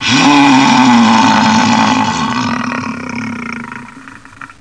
BEAR ROAR

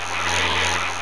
zap; thexder

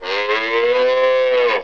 cow; REDNECK; RAMPAGER

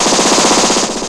MACH GUN

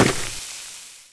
land lava; ambient

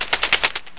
arrow; My Science Fair

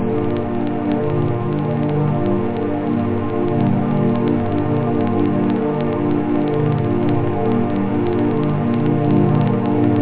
atmo, synth chords; da capo vol1

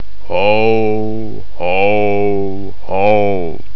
hoho

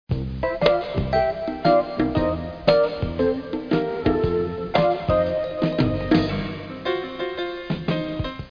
riff; DR BRAIN; BUGS

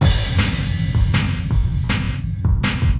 DRUMLOOP D+B 160, Drum+Bass Loop; eJay

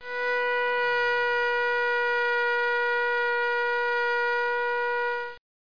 violin

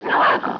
WOLF; THE REALM

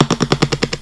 wood; Little Red Riding Hood

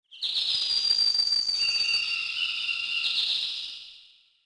BIRD, Zoom3City; zones

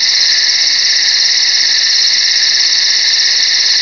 country night; PC Gamer IT CD 41; Daikatana

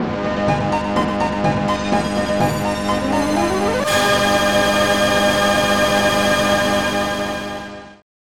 pizzicat; SoundMOD Volume 2

